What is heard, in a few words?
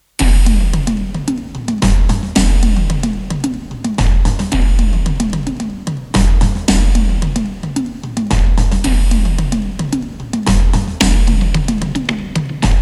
Cine Drums